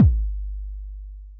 kick, Zoom MRT-3B

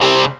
GTR Crunch, Dirty; Club-50 - Foundations Roland

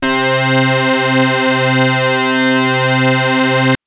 Synth Ensemble c3

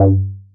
Synths BASS

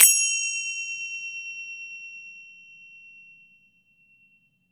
FINGER CYM; AKAI S-Series CD-ROM Sound Library VOL-5

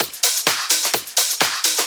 Discohouse Loop 128 BPM

